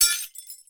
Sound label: broken glass